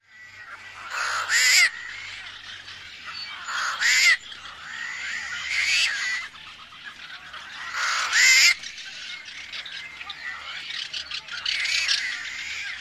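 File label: song, Tricolored Blackbird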